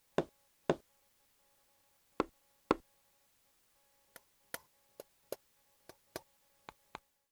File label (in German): button noises